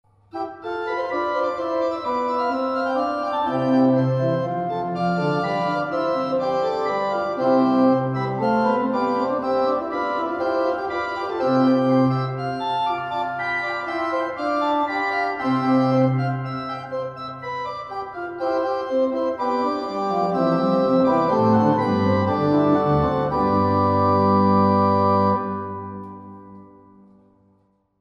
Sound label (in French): bourdon prestant